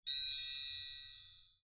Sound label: Power Up End; Kung Pow